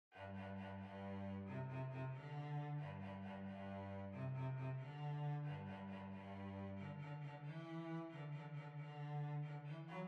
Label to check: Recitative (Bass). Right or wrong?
Wrong